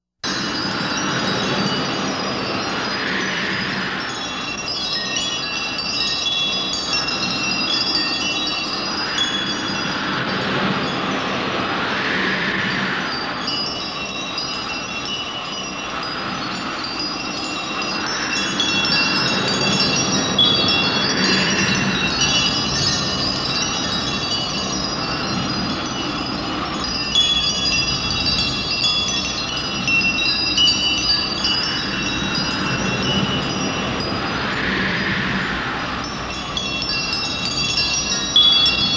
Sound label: planet atmosphere; background; TOS